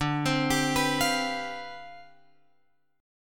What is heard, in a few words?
D Minor 13th D